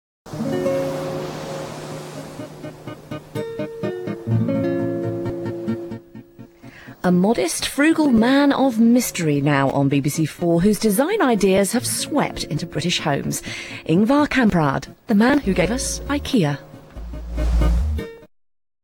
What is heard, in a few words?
jingle; bbc